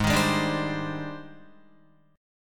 Ab Major Sharp 11th Ab down Guitar; AbM#11 strummed; JGuitar

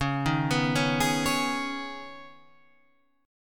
C sharp 7th Suspended 2nd, guitar chord; C#7sus2 Chord {9 6 9 6 9 9} arpeggiated

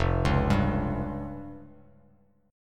F Suspended 2nd Sharp 5th, Bass Standard; Fsus2#5 arpeggiated; JGuitar

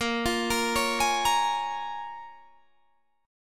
Bb Minor 7th; Bbm7 arpeggiated

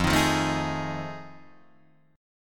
F 7th Sharp 9th, Guitar, Open D

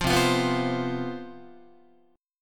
C Major 7th Suspended 4th, C, down, Guitar; CM7sus4 strummed

